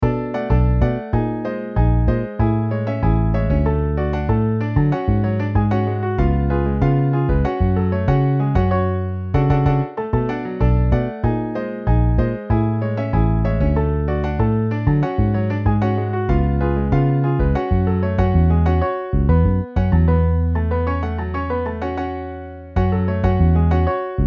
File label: Piano Bass; IRE PRODUCTIONS